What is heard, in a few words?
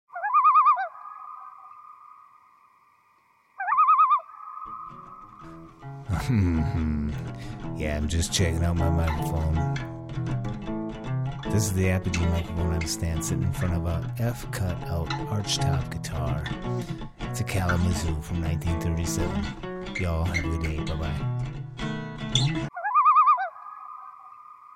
testing apogee mic as a guitar pick up for arch top kalamazoo